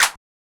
Clap, Hip Hop Drums - One Shots; Cymatics - 9God Hip Hop Sample Pack

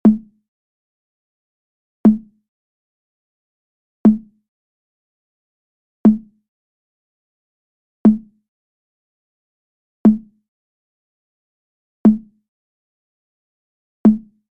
120 BPM G Min Percussive Hit; Cymatics; Blue Eyes

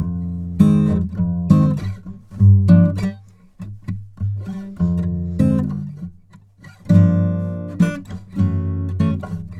Xmass Guitar Loops 100bpm Fmin; Guitars; Ghosthack; Advent Calendar 2019

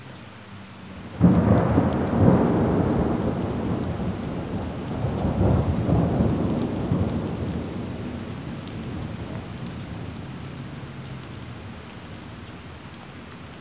Thunder Close; Noise; Lofi; LIFE

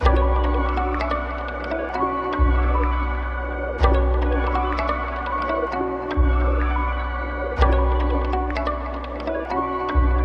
127 BPM B Min, Trap Melody Loops; Spirits; Cymatics; Nightmares

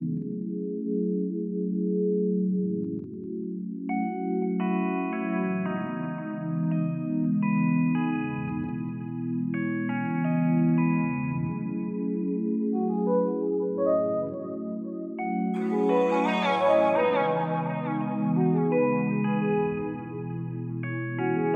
85 BPM C# Min, RnB; Distance

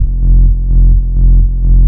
Progressive Beach Bassline Pump F 128 BPM; Loops

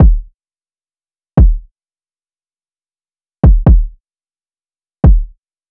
Drum Loop 131 BPM Kick; Loop Stems; Cymatics; Vibes II Drum Loop Collection; Temptation

